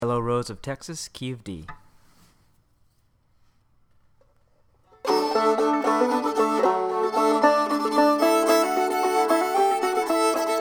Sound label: yellow full fiddle